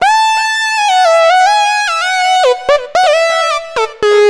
xtra lead

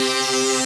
Synth, DnB One Shots

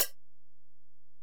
HiHat, Alesis DM-PRO

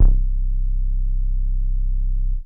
Bass Synths hits and octaves

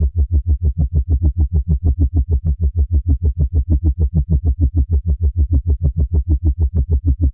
LFO Bass, Drum n Bass; KJ Sawka; Drum n Bass & Beyond

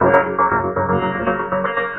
Valve Guitar; Strings; Sonic Foundry (Sony Creative Software); Myths of Technology

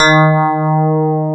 PIA DX E-PIANOS

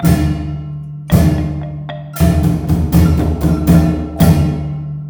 MALAY L, Heart of Asia; Spectrasonics - Heart of Asia CD2

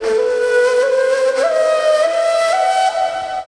SIERRA FLU

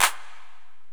Korg x5, Drums

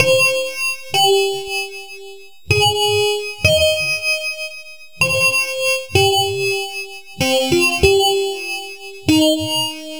Melody, IDM

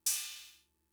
Cymbal, Fricke MFB-522, Drum Machines

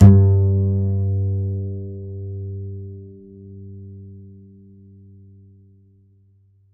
DBASS G; Univers Sons; Jazzistic

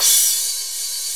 CYM CRA, Cymbals; AMG; Now CD-ROM (Roland); NOW! Drums

